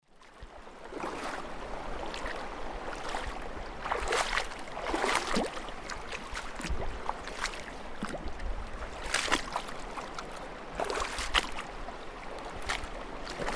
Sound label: walking in water